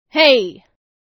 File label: Belt Voice